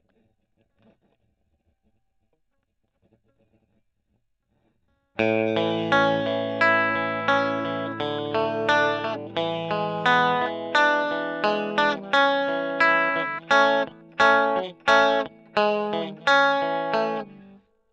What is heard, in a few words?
E. GTR Gefell